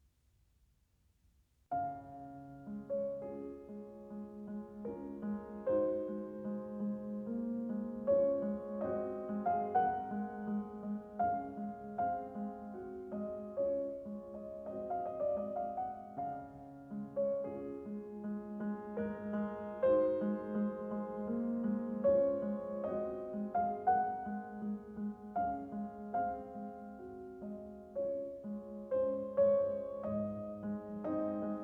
Preludio in re bemolle maggiore, Chopin - Piano Works; 'Raindrop', op. 28; Chopin - Piano Works - Vladimir Ashkenazy

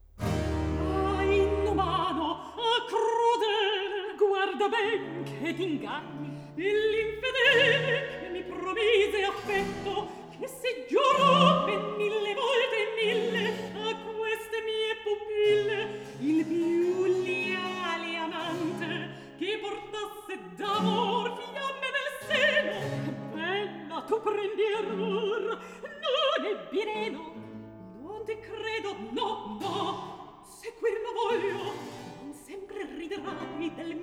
Recitativo; Atto I; Scena 12; (Alcina, Bradamante); Antonio Vivaldi (1678 - 1741); Vivaldi - Orlando furioso (2004)

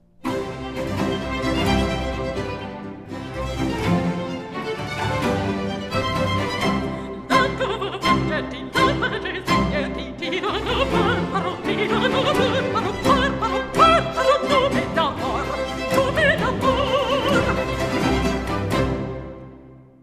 Aria, L'arco vuo frangerti; Atto III; Scena 3

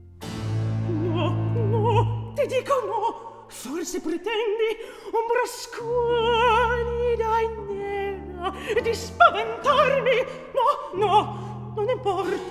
Recitativo; Orlando; Antonio Vivaldi (1678 - 1741); Vivaldi - Orlando furioso (2004); Atto III

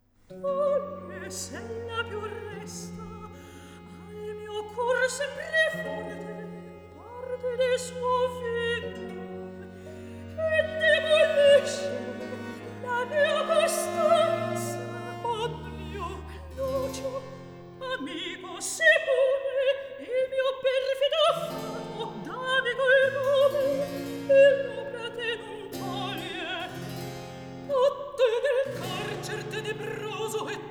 Recitativo; (Manlio, Lucio, Servilia); Toglie, s'ella piu resta; Antonio Vivaldi (1678 - 1741); Vivaldi - Tito Manlio (2005)

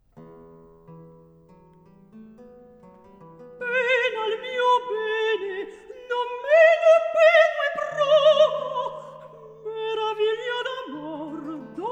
Recitativo; Atto III; Scena 9; (Angelica, Medoro)

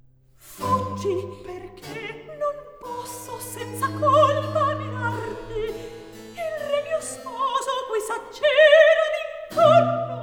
Recitativo; Atto II; Scene 7; (Costanza, Roberto); Fuggi. Perche. Non posso

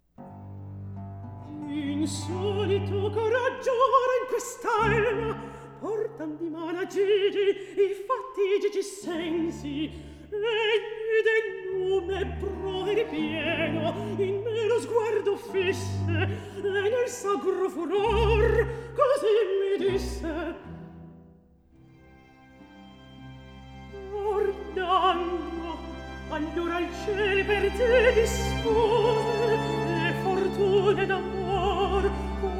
Recitativo; Atto I; Scena 5; Orlando; Antonio Vivaldi (1678 - 1741); Vivaldi - Orlando furioso (2004)

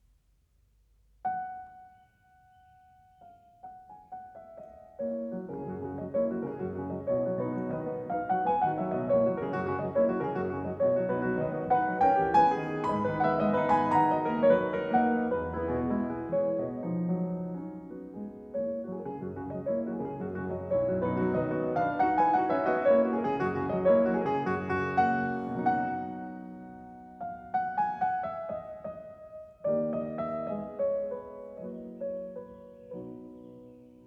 Preludio in si maggiore, piano; Chopin - Piano Works - Vladimir Ashkenazy

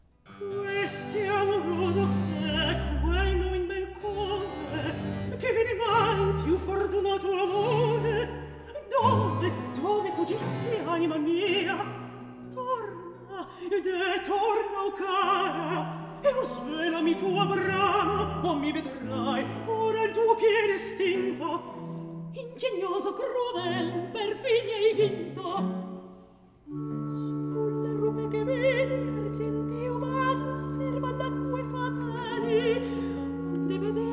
Recitativo; Scena 6; Angelica, Orlando; Antonio Vivaldi (1678 - 1741); Vivaldi - Orlando furioso (2004); Atto II

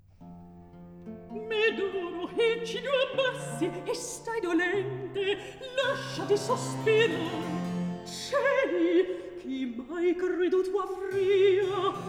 Recitativo; Atto I; Scena 9; (Alcina, Medoro); Antonio Vivaldi (1678 - 1741); Vivaldi - Orlando furioso (2004)

